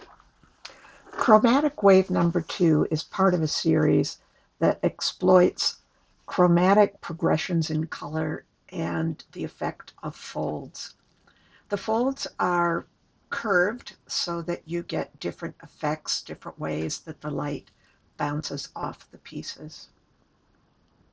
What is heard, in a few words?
chromatic wave; hensel